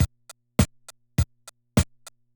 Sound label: Loop, classic machines, rpm 40